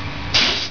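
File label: STEAM PUMP, MACHINES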